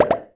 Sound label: Sfx Amb Pop